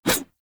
Large Dagger Whoosh Heavy; Weapons; Enemy; Daggers

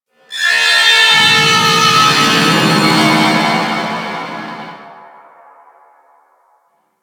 Space Trench, Ambience Space Battles; Warframe

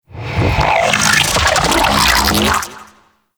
Vocal Attack; Sentient; Warframe; Sentients; Dolicholyst

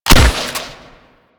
Hunting Bow Prime Fire B; Warframe; Weapons

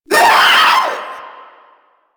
Proto Infested vocal short attack

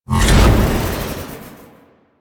Army Cast Release; Warframe; Warframes; Hoplite

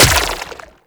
Grenade Bounce; Warframe; Lasrian; Lasrian Soldiers; Weapons; Lasrian Chem Nox

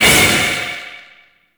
Void Release Blunt; Weapons; Warframe; Duviri; Incarnon Adapters

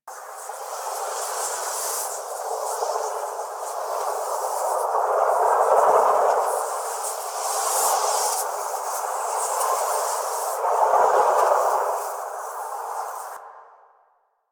Ethereal Shield Bubble Loop; Beacon; Warframe; Zariman; Void Angel; Abilities

